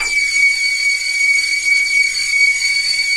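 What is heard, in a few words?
TEA KETTLE, household; AKAI Anime Sound FX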